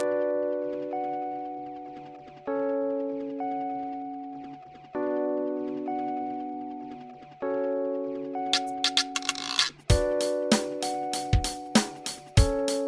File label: Rhodes Piano